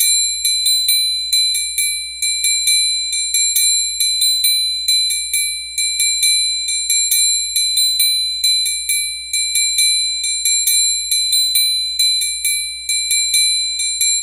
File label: Triangle 135 BPM; Перкуссии (Percussion Loops)